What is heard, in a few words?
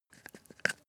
rodent eat short